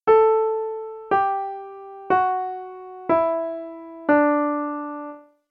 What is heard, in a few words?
DOWN Treble D maj